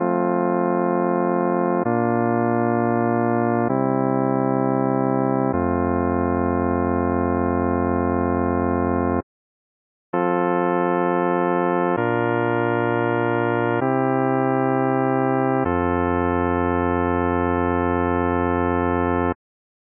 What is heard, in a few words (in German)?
vollkadenz rein; Psychoakustische Grundlagen der Musik